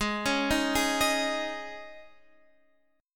C sharp Suspended 2nd, chord

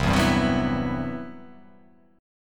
C Major Sharp 11th C down; strummed; CM#11

